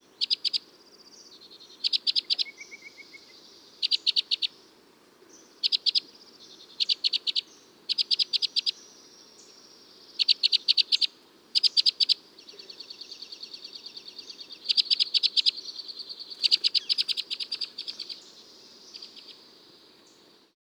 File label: Flight calls